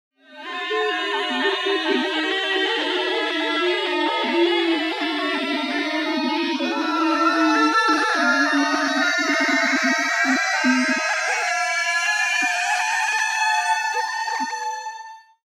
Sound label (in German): ruine gliss arab alle